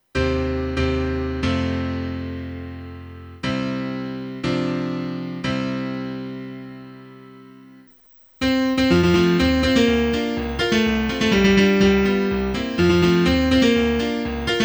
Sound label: accomp w vocal line